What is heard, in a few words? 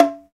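PERCUSSION BONGO, Latin; Roland L-CD701; Bongos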